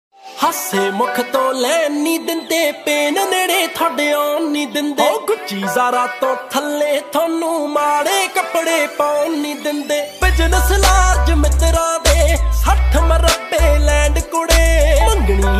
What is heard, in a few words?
Punjabi Ringtone